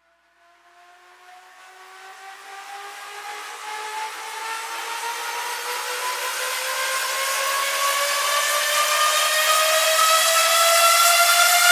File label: Cleave Rise; Ninja Tracks